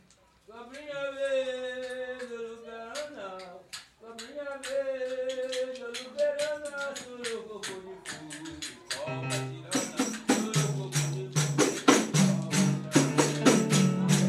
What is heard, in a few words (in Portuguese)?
fanti baiao de princesas; cobrinha verde